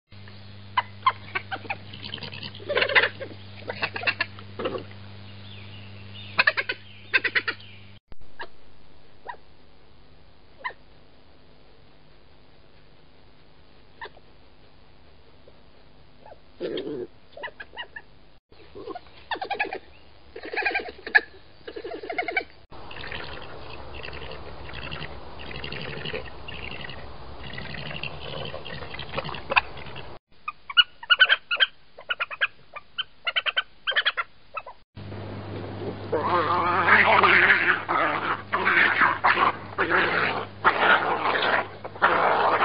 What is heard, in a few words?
Badger sounds